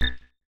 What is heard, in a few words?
pet sleep